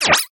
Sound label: synth misc, retro synth; retro synth SFX